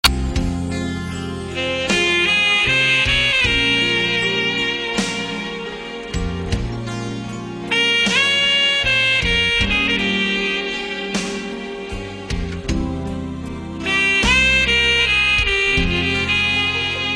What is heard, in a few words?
saxo